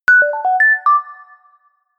feedback positive jingle

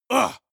male combat hit soft; soundpool

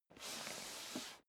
step dress boots metal dry slide long; schritte; Footsteps; menschen

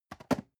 storage fall table soft, box; objekte

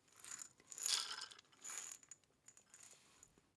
glass pepper tilt; berufe; gastronomie; soundpool

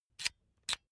electric drill template slide in slow; maschinen; bohrer; soundpool

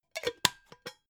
pot lid close; objekte; topf

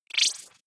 wobble rise short

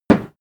impact dull metal damped; soundpool

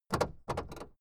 car camaro open trunklid short

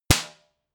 party popper; hits-explosionen

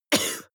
cough male single short; soundpool

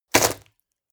wood break short; foerster

